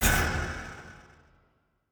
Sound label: fallen projectile